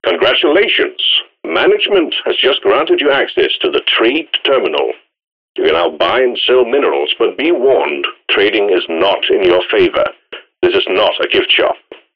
Unlock Trade Terminal

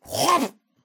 Dwarf Misc spit

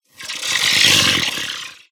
Glyphid Exploder Expand

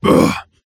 Environment Sandstorm

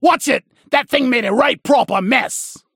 Septic Spread Killcry

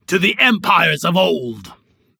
Bar Cheering when drinking

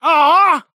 Arcade Game Lost Life

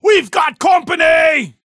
Reaction Mini Boss Roar

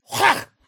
Dwarf Misc spit

